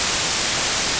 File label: noise